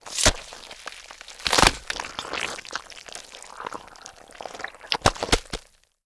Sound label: GORE Death Move Sequence Long Brutal; stereo; GORE & SPLATS; Universal Sound FX